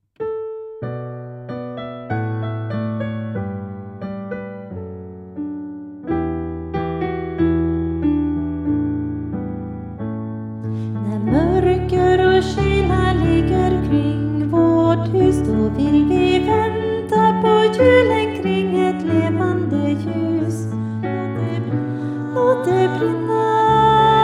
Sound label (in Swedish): Ljuset i Advent diskant